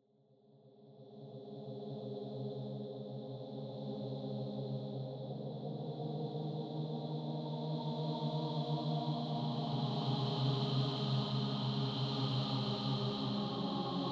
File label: Drone Musical